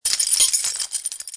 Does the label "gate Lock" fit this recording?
Yes